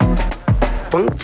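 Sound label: funky beat, Drum loops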